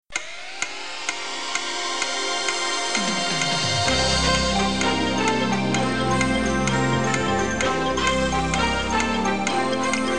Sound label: countdown